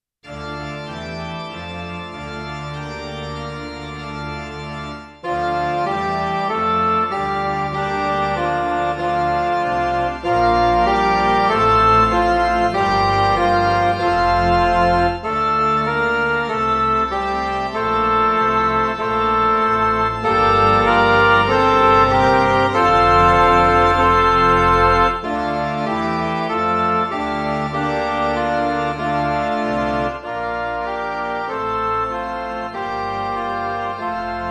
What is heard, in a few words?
midi